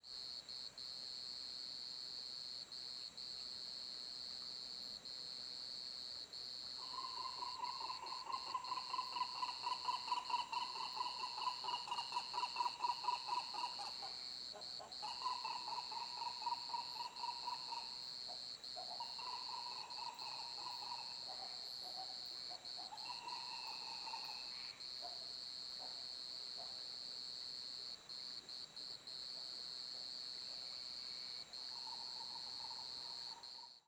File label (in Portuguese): Ave passa cantando noite; aves